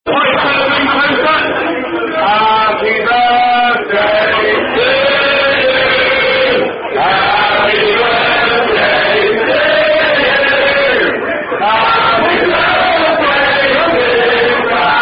Dirty Pint Drinking Tradition With Zulu Warrior Song